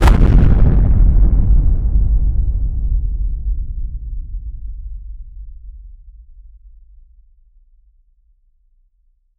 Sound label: volcano eruption